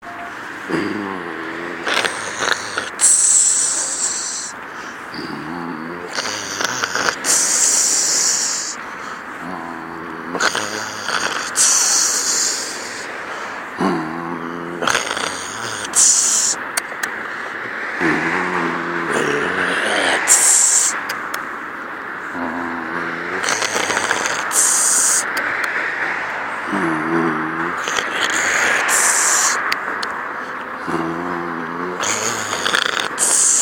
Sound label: brief mutating loop